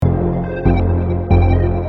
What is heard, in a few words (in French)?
loop synthe